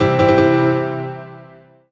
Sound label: MUSIC EFFECT Solo Piano Positive (stereo); startide macos switch windows gamerip 2017